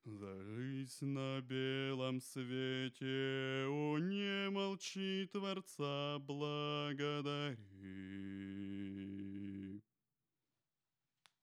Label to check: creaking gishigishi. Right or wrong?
Wrong